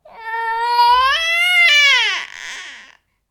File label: baby cooing